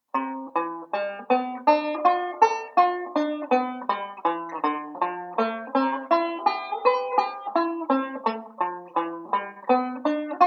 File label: Arpeggio Pattern; Beyond Chord Melody Volume II